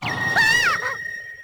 AHH

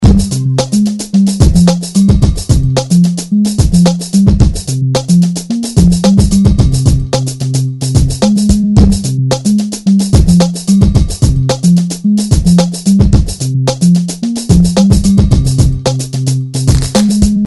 music

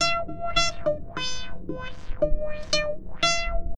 Funkified Asian

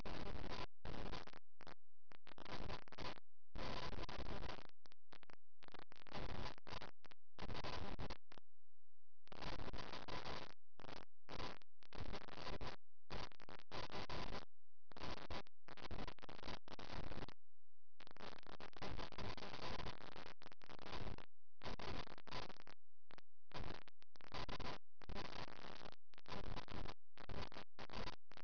drum

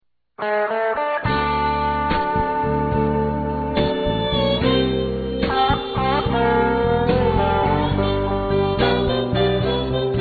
CS Blues

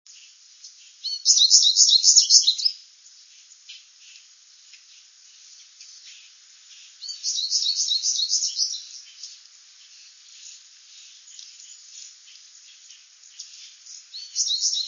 goldfinch song switching

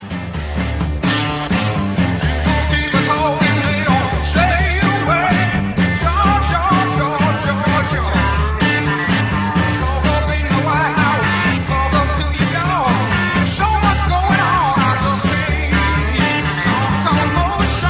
Commotion

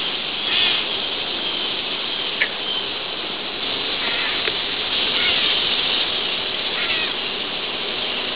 catbird; birdsongs

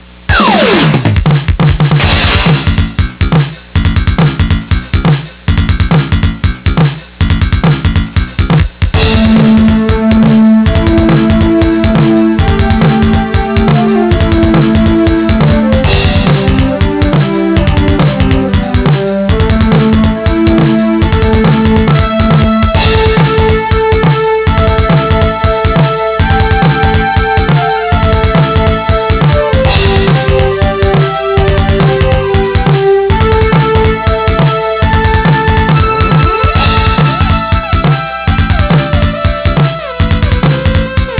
bass

